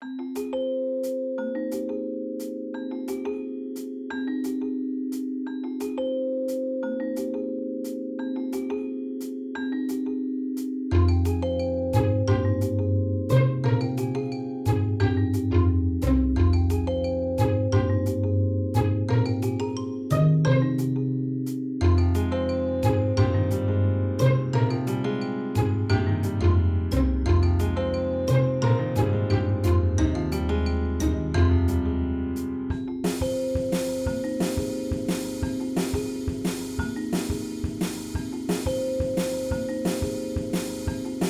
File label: Song Stealth; Octodad Dadliest Catch